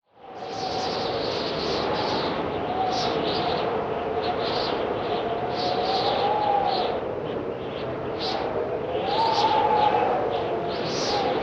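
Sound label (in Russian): жалкий ветер и снег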